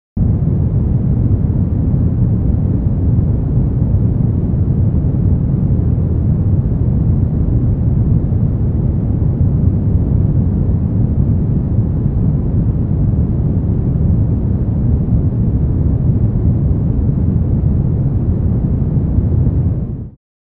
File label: 100 120hz pink noise